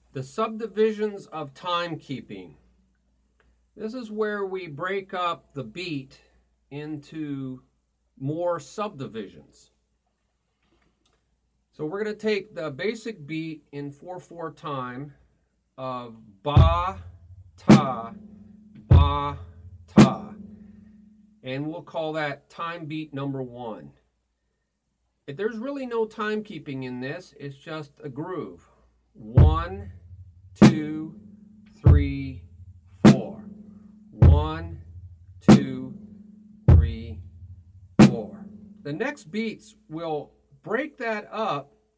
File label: Time Intro 1 2 3 4 Ba Ta Ba Ta